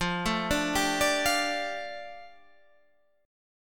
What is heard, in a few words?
F 6th Add 9th F down Guitar Drop D, chord